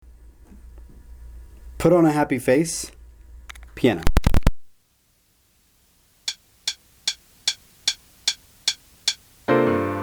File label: piano